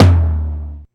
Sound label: Tom, LinnDrum